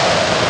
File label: Winter games; Sfx Wintergames